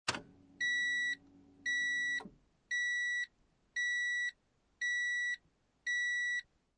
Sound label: Car Interior Battery On Alarm, Car Interior, 1996 Acura Integra, Battery Alarm Running, No Engine; Transportation Automobiles